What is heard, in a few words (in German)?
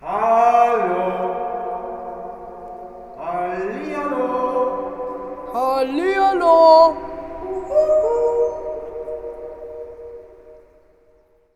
Hall Echo